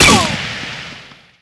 Shoot; Skylanders Cloud Patrol; Arcade, iOS, Mobile; Bouncer SFX

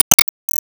Enemy Cactas Appear

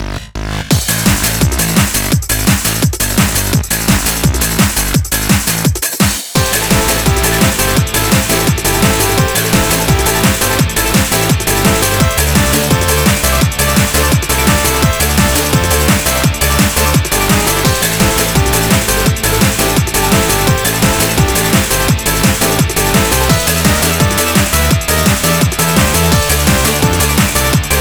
Bgm Bomb

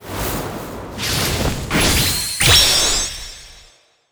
Ingame Result You Good

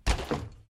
Swing Cupboard Open; lethal company

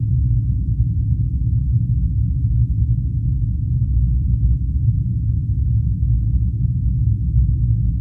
Peninsula Old Ship Ambient

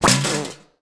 Throw away

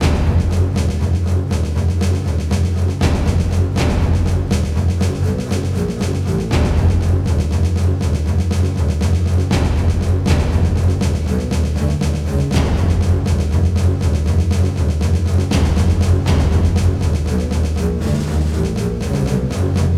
Boss combat; Bosscombat Ch02a 06

